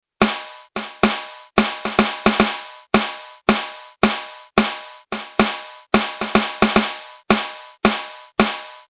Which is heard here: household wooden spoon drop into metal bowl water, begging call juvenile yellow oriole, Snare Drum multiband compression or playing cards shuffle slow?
Snare Drum multiband compression